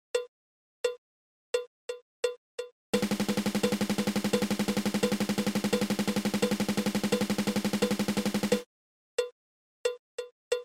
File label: Single Stroke Roll